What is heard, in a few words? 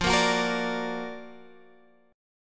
F sharp Augmented Major 7th, F